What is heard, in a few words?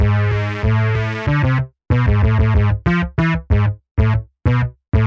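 weirdo octopus synth bassline, 95 bpm; SYNTH AND ELECTRONIC LOOPS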